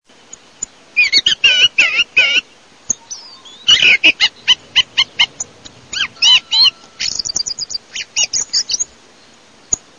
tordo

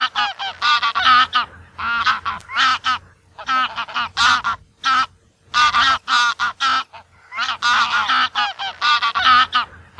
OCA SELVATICA

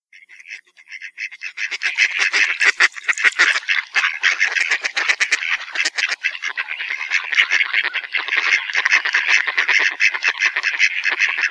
GERMANO R

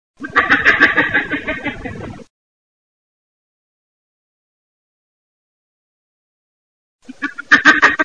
cervo sika yak yak

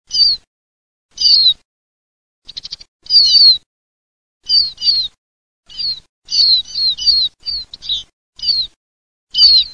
LUCARINO

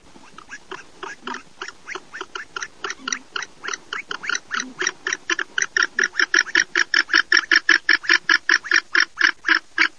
oca delle ande

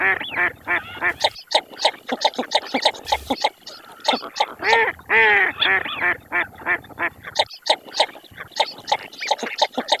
mestolone, birdsound

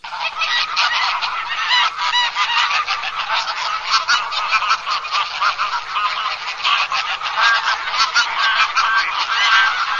OCHE SEL

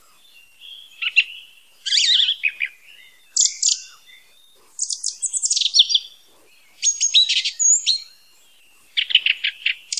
TORDO OLANDA

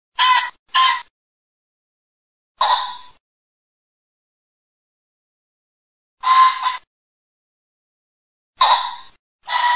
FAGIANO, birdsound

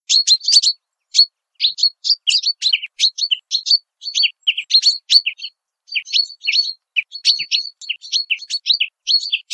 Fringuello gruppo femm volo

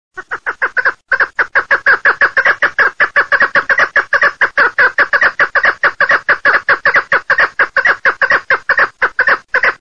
PERNICE, birdsound

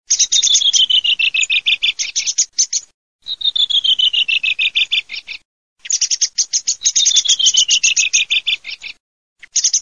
SCHIAMAZZO

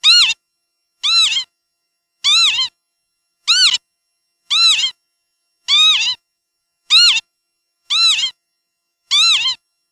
PAVONCELLA

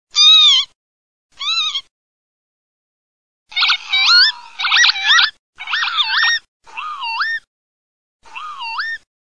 PAVONCELLA, birdsound

